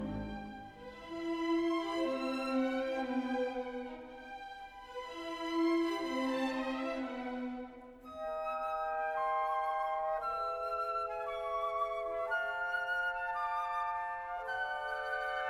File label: Counter Melody; 4th Movement Gesangsperiode; Bruckner 9th; Rattle